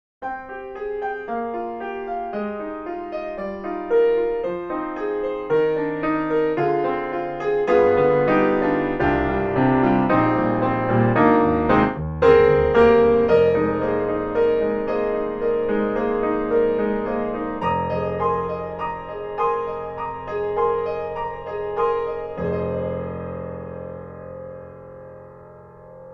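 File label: Room Decca Tree, piano; Soniccouture; The Hammersmith Professional Edition; M50s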